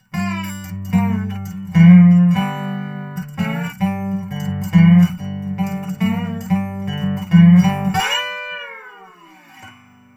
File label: dobro through guitar minus piezo